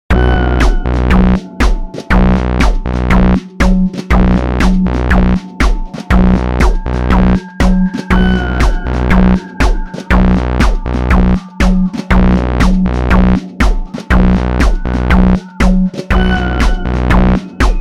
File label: tonal vibe; soundscapes 3